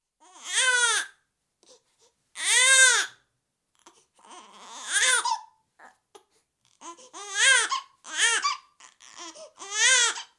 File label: 7 week old baby girl, frantic crying; Babies; Voice; BBC